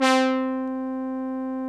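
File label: Juno brass, analog synths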